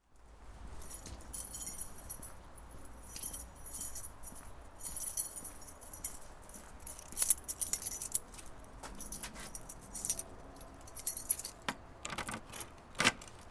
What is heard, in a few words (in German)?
schluessel und briefkasten; zoom h6